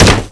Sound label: body impact wood